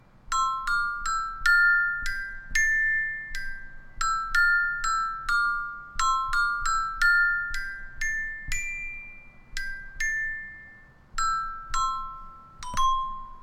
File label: garageband; rugrats